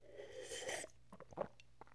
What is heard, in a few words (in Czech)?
piti; Mafie 1